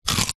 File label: human bite carrot